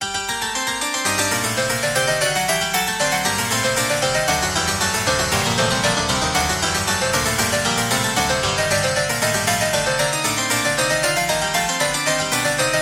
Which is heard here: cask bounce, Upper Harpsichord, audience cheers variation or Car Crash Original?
Upper Harpsichord